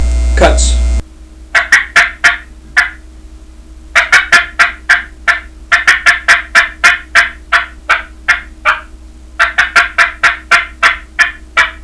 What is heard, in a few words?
dia cutts, turkey; dixie